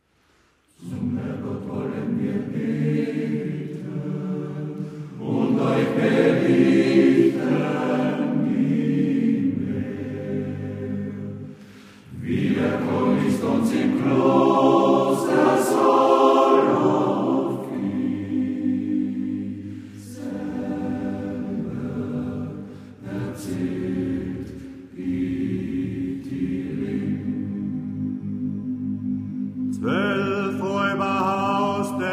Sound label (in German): chor; zirbenlandchor